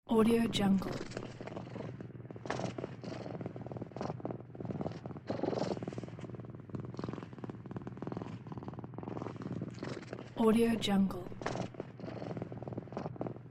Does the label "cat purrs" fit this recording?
Yes